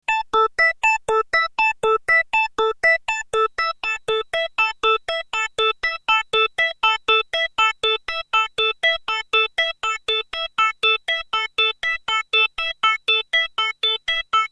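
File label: Arpeggio Frequency Sweeps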